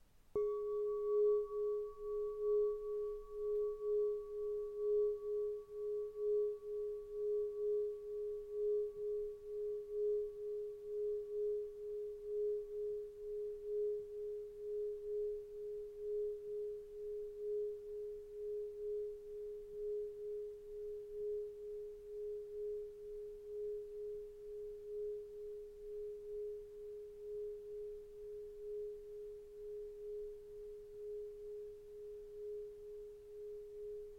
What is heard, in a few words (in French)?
Cloche tubulaire 25mm G4